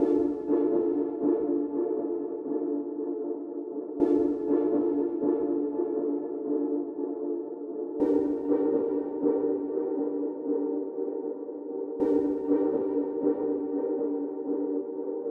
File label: 120 BPM D Min Delayed Pad; Trap; Loop Stems; Custom; Cymatics; 2022 Melody Collection; Trap Pt 1